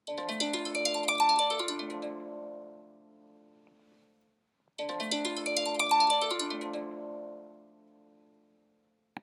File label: Harp; What is The Best Alarm Sound to Wake Up To?